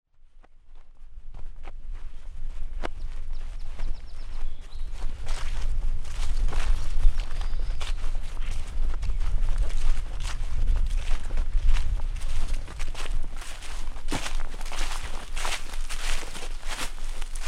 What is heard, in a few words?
Walking then Not; Wisconsin 2019